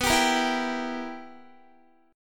B Major 7th Suspended 4th Sharp 5th Guitar, chord; strummed; x,14,17,15,16,14

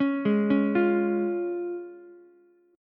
C sharp Major, Ukulele; arpeggiated; JGuitar

